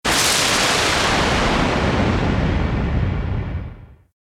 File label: noise burst